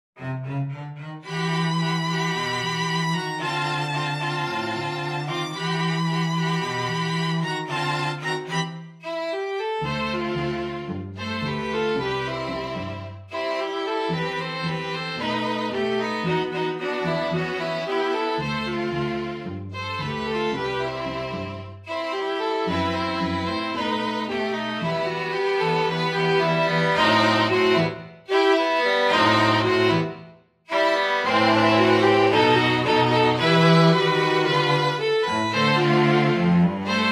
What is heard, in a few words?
KEY OF G STRING QUINTET